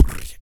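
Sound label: vox percussion, Vocal Planet Jazz FX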